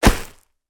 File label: dirt drop